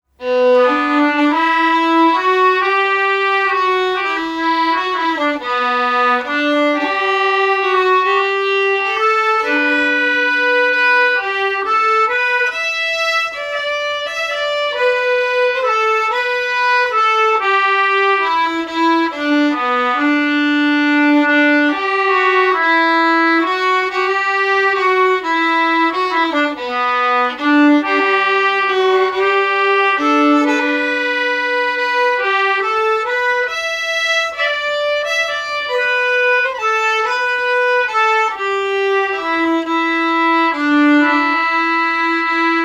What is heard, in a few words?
waltz